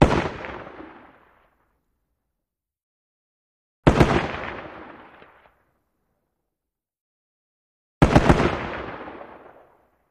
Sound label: .50 Caliber: Single, Double, And Triple Shots